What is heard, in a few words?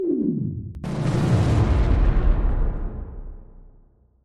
plasma; no longer alone sfx